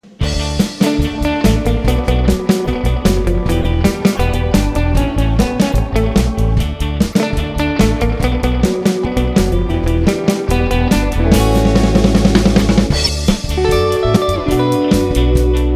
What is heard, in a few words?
Spy Tones